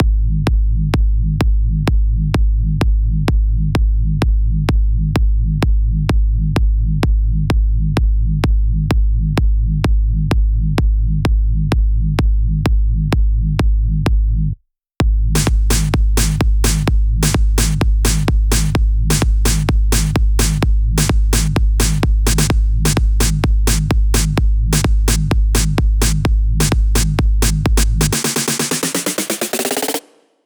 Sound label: 128 BPM Full Drums; Vengeance - Essential Clubsounds Vol.5; Big Line Kit; Root G; Drums & Perc